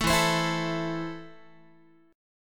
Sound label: E Suspended 4th Sharp 5th, Guitar, Esus4#5 chord; strummed; JGuitar